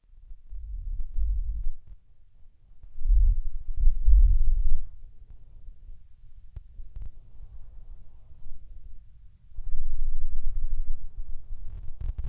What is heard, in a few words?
ripple toss; b owen; stone and ripple